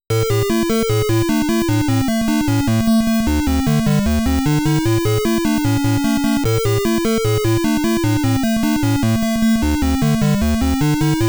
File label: Challenging Stage Music